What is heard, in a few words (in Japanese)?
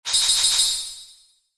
ランク レア度4